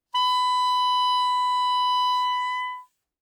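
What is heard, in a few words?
Soprano Saxophone non vibrato ff B4; Woodwinds; Bb Soprano Saxophone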